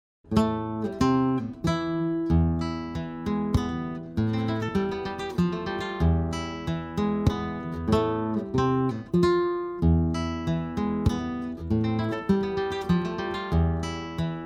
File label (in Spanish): soledad guit